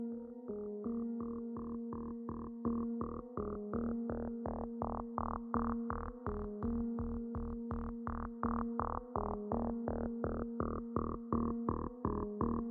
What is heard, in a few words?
Infinix Ringtone